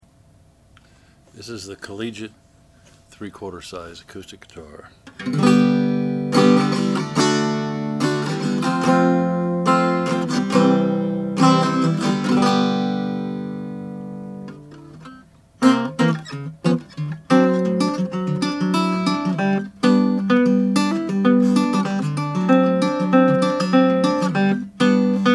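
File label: collegiate 3 4 sunburst, Guitars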